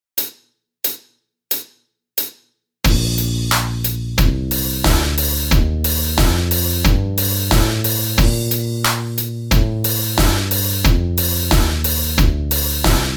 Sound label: Band Warmups backing track; fish scales A